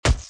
axe body

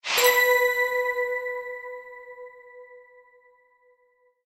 New Level Zvuk; Strikeforce Kitty

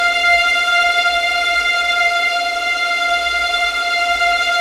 tremolo; ice age scrats nutty adventure; Level4 Hidden World

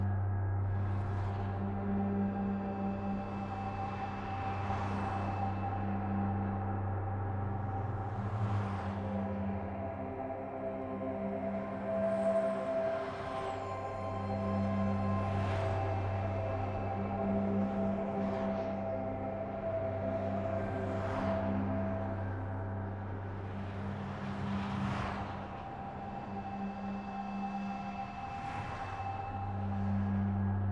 UI HUD Front End Menu; Battlefield Hardline; Omaha

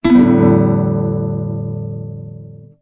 Guitar Strum; serious sam siberian mayhem 2022 pc gamerip; Easter Egg

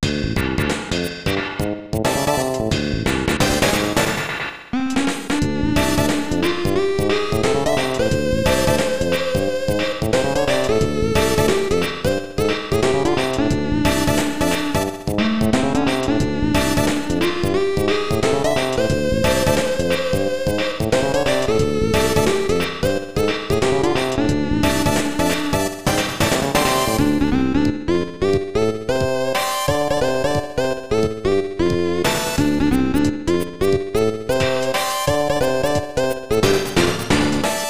Dungeon; Gokinjo Bouken Tai; snes

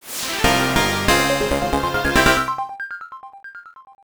Shooting Cleared; brain age express arts and letters

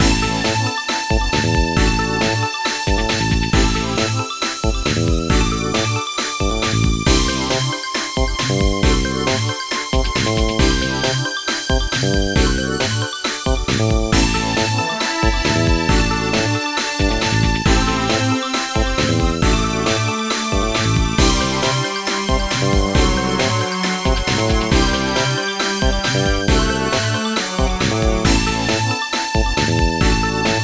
Result Jingle; Bleach DS 4th Flame Bringer

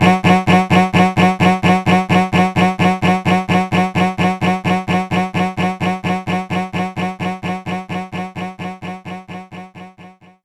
Cello Attack

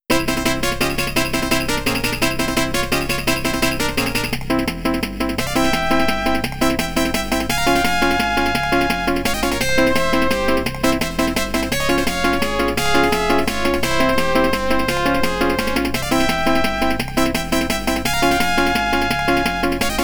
Flamenco; Cattle Flamenco (Cattle and Red Cloak, Flamenco's Not Crying)

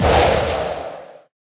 Rushwave; New The Denpa Men

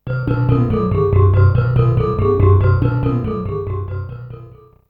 jingle ~ warp

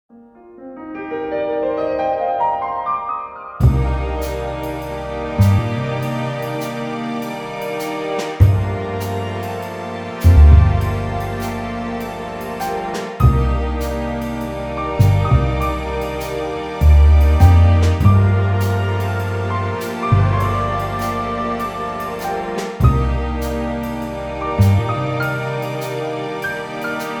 Trailer Music; chicken police; Farewell From Fowls

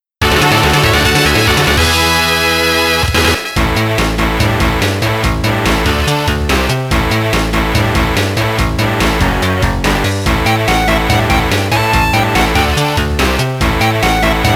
Competition Match - Battle Victory

